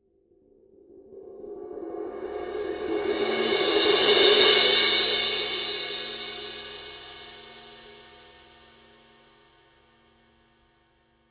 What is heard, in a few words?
Paiste 2002 20 medium Crash roll